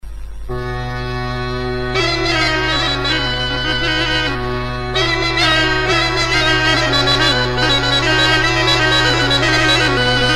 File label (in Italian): launeddas su ballu, strumenti, villaputzu